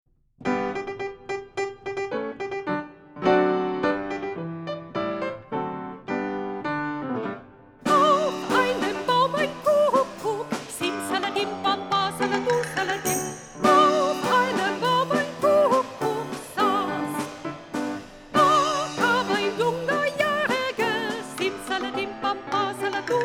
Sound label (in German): komplett mit Gesang; Auf einem Baum